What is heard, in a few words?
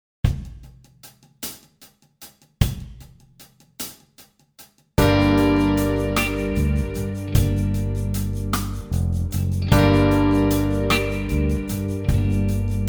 guitar